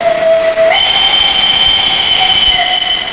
klaxon, Train; OpenBVE

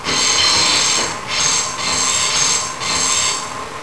Flange, Train, R62 Kawasaki; OpenBVE

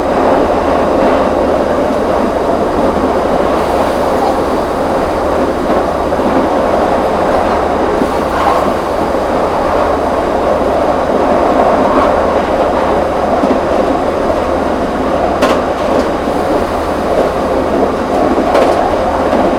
Run, Train; OpenBVE

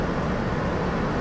loop, Train R110B; OpenBVE

